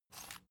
salt mill squeak soft; berufe; gastronomie

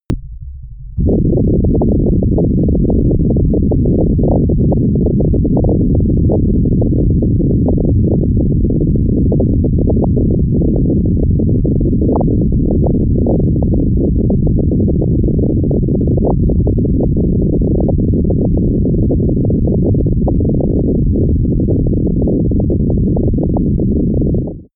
overdrive rumble, sciene fiction

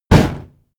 fire hit; whooshes rises; hits impacts

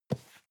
cardboard box medium grab slide; objekte

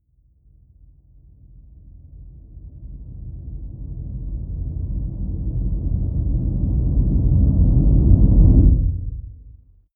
deep noise synth rumble sub, Risers; whooshes rises

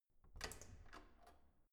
corridor door soft open

